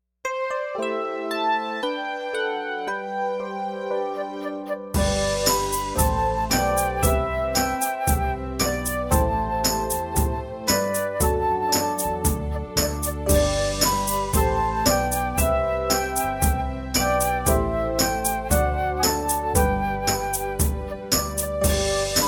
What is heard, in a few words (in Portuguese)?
com flauta